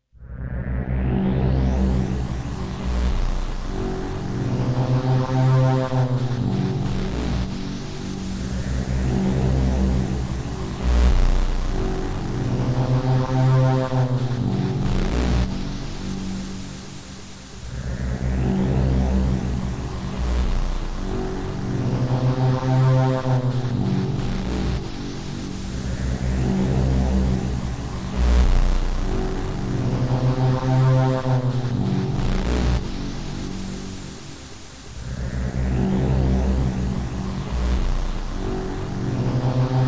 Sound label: Dark Ambience